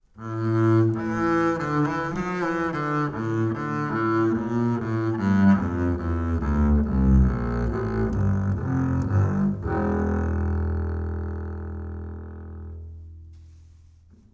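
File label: acoustic bass Bowed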